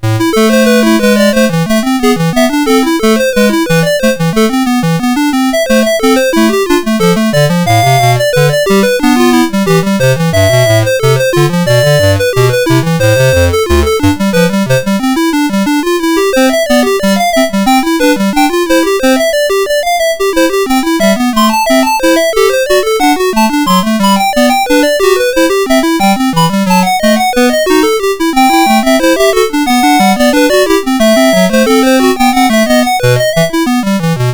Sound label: Two Part Invention No13 A Minor Casio CZ, MOS6581R2